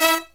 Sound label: HIGH HIT; USB Soundscan vol.29 - Killer Brass Riffs; AKAI; HIGHHITS